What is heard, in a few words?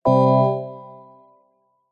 multimedia notification digital bell warm positive